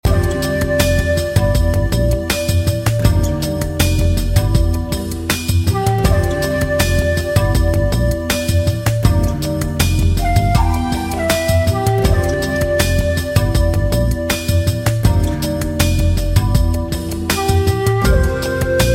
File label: relax music